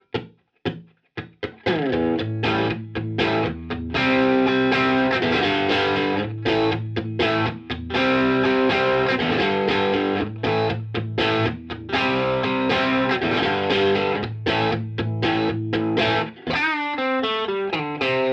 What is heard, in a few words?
st white s drive rear